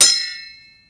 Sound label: kesi open